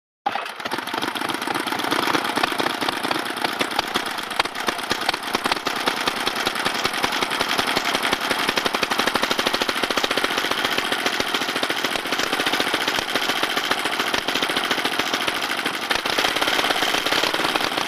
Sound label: Idle Off Old Motorbik